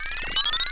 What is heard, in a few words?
window; XDM